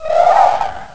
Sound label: Bound Chest Spirits Noise